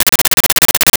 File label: Arcade Movement; Video Game Sounds; 1001 Sound Effects